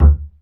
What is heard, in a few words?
acoustic bass fx; Spectrasonics; Bass Legends